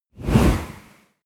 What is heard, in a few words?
push